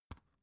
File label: dvd case open soft